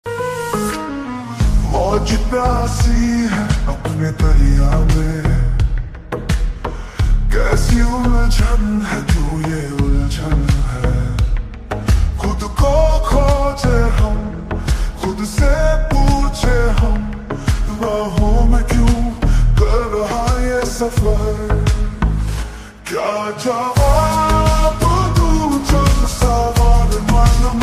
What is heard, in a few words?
sad ringtone caller tune